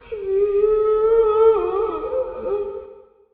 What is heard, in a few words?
Creepy Moan